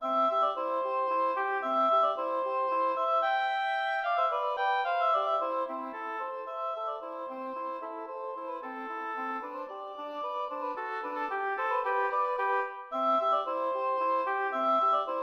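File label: oboe english horn duo; ernest krahmer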